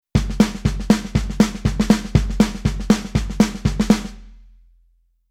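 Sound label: Rhythm Country pattern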